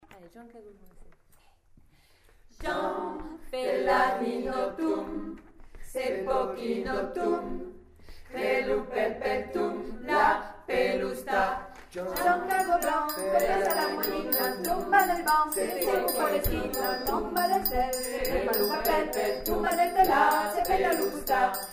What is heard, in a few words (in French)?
Joan cago blanc essai polyphonique; voix